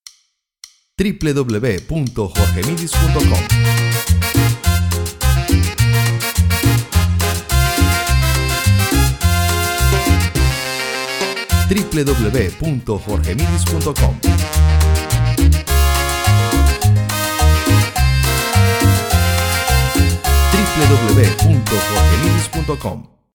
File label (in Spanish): Cumbia caletera